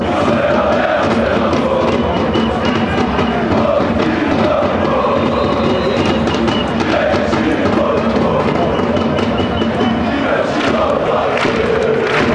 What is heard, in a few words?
ole ole ole oh, chants